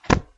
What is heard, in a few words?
book closing